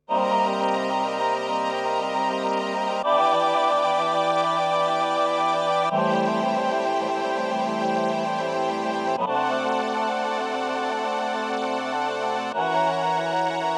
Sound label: chords